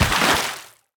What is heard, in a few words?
stomp path back to pond, crocs tower